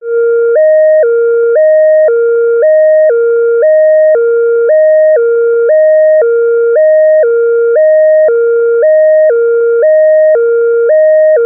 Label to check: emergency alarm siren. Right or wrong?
Right